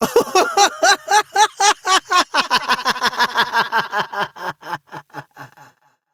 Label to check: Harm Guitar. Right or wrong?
Wrong